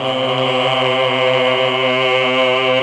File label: CHOR; ILIO; Propeller Island Legendary M400